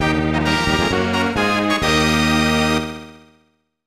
Unused Fanfare; Gokinjo Bouken Tai; snes

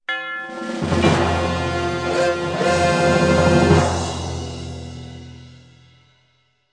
Battle Victory; Pax Romana

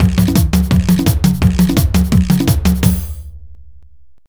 jingle raindance; Harvest Moon: Frantic Farming; NDS

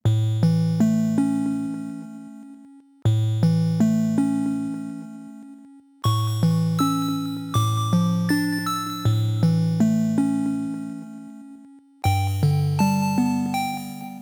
Lullaby for a Witch (Chiptune Version); Ikenfell Original Game Soundtrack 2020

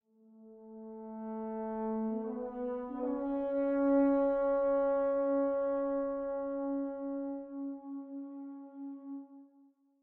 85bpm; Legends of Pegasus; windows gamerip 2012

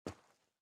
SFX step rock; out of reach treasure royale 2020 gamerip pc

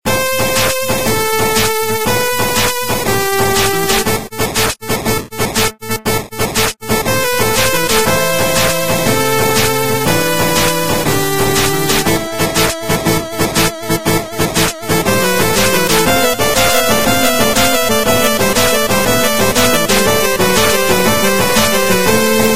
music; Dash Quest; android

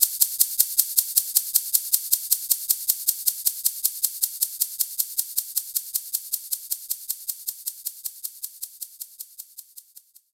Cabasa; knights try

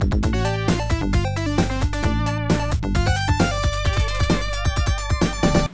Checkpoint; OutRunners; arcade; Genesis

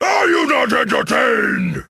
Orc Taunt

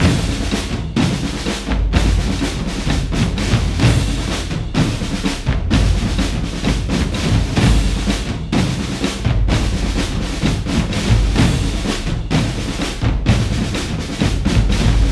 Drums; For Honor

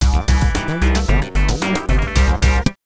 Sting End Mission; Monsters vs Aliens; NDS

